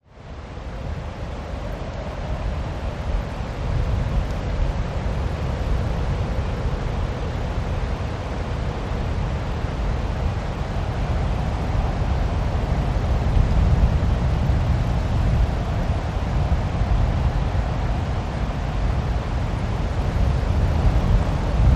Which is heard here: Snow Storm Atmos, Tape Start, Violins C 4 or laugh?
Snow Storm Atmos